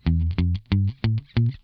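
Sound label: damped SCALE C1, Funk Guitar; 90 s Sample CDs; Zero G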